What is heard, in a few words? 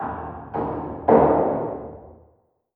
Random Single Hammer; moul